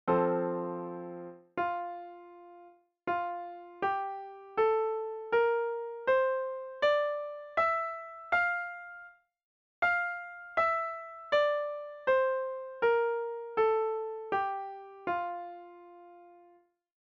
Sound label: scale